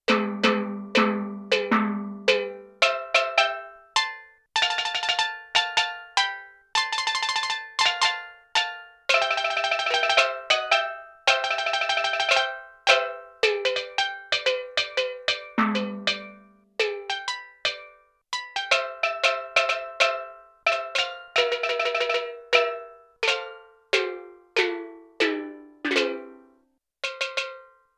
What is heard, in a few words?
Steel Drum, Yamaha TX16W